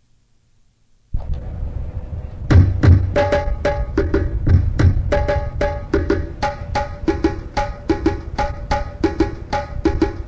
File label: drum rhythms